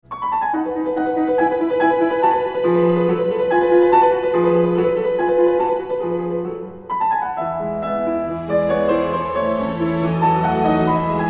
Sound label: Piano Concerto K414